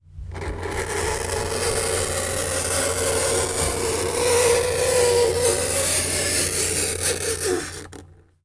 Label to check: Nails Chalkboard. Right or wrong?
Right